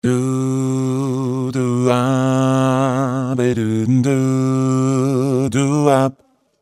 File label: octave down Formant down